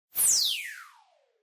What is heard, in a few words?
Laser Gun Blast Fast; laser fx